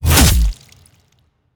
Spikes Damage; helltaker

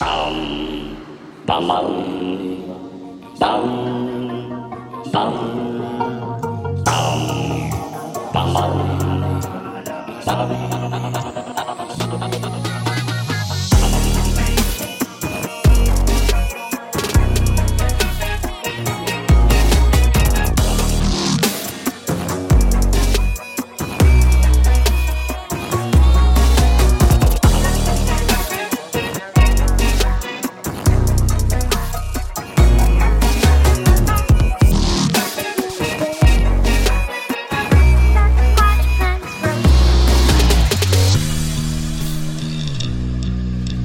Plant Beat

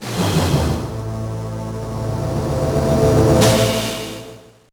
Mini Game Loose; Finding Nemo; GBA

